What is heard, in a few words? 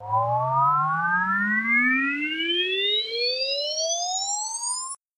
Sheppard Tone